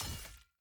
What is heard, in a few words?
Attack Axe